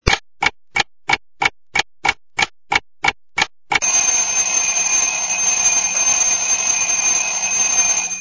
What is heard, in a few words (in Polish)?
Budzik; SMS Ringtones collection funny and crazy